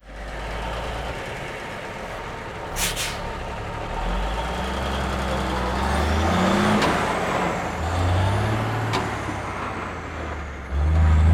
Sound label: 18 Wheeler Away Shift Slow; Vehicles; Cars & Trucks